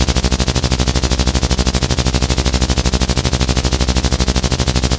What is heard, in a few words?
engine Circular; Kenney's Sound Pack; Sci-Fi Sounds